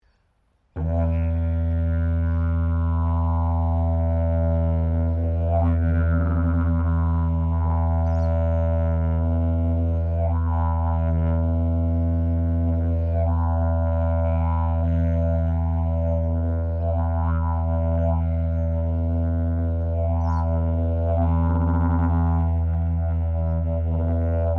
Didgeridoo Traditional Tapered Piece, Traditional Australian Aborigine didgeridoo; Traditional Australian Aborigine didgeridoo designs; Miscellaneous Musical Sound Effects